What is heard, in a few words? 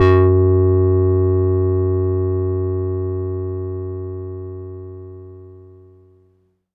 Octaver f#3, Korg Poly-800